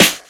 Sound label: Snares Electro